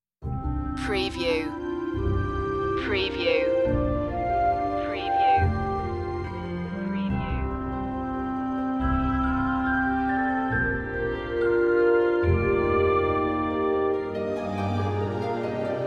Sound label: into deep ocean no choir